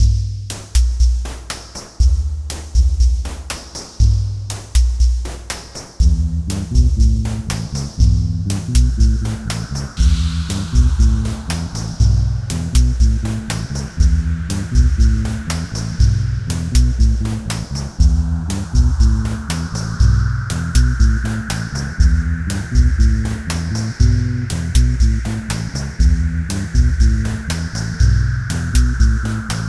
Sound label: Drum Synth Groove; reaper